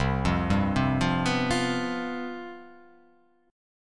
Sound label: C Major 7th Suspended 4th